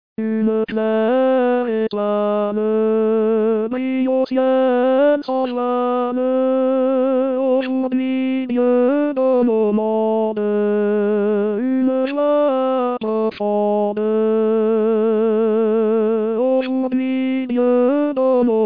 Noel Ukrainien Tenor

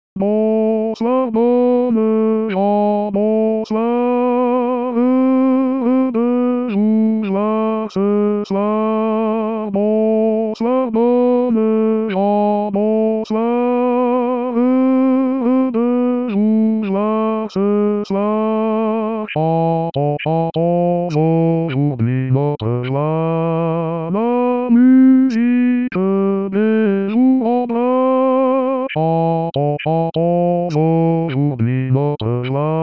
Baryton; Baryton 1

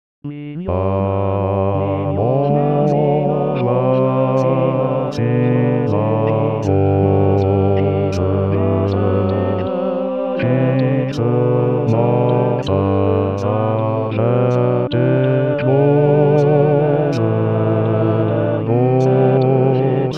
Basse; + 3 Voix

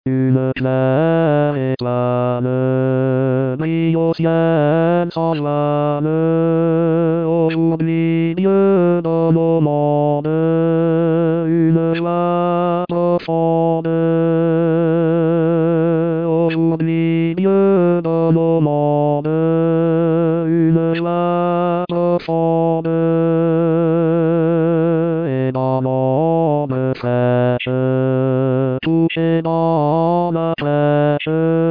Baryton; Noël ukrainien - Baryton

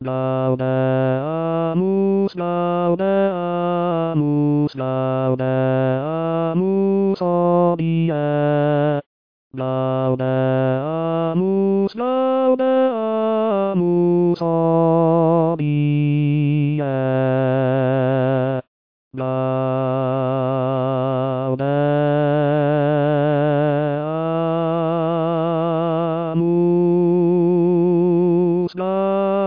Gaudeamus Tenor

